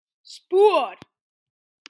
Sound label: spurt